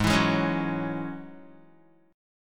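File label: A flat 7th Suspended 4th Sharp 5th A flat Guitar, chord; Ab7sus4#5 strummed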